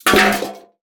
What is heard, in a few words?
objects, World of Tanks